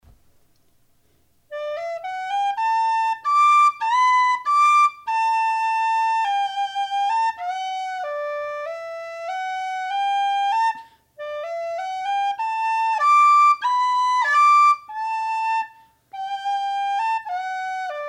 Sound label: whistle